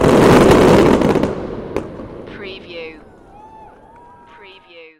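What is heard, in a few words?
Big Bang Cheer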